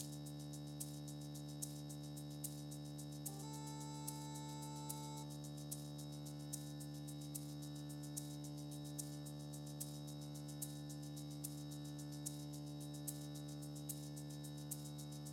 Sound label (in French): bourdon